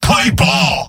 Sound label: m battlecry; tf2; garrysmod; mvm